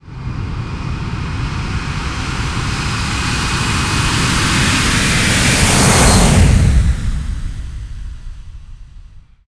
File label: ship Fly By